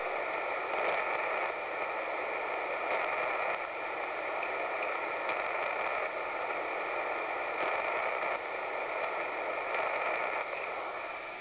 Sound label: mot noise